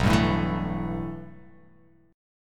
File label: B 7th Suspended 4th Sharp 5th